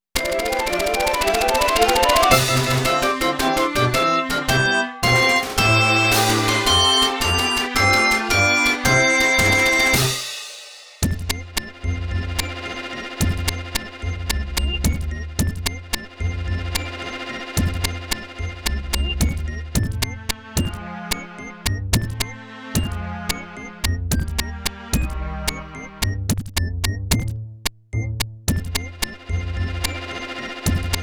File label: Spaceship Power-up!; Pikmin Worlds